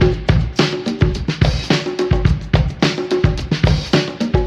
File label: Drum loops Funky